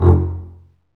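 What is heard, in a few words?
STRINGS, Cb Bowed Marcato; Roland L-CD702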